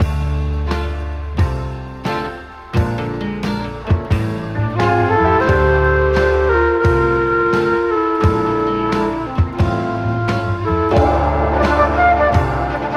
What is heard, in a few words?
full, Loops Phonky